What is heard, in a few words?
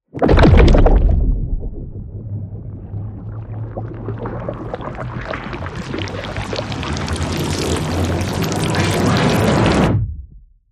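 Riser Character, Cinematic Risers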